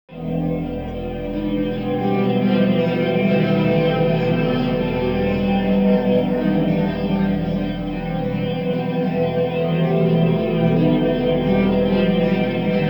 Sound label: Pad; the saigo no nihonhei utsukushiki kokudo dakkan sakusen; ps2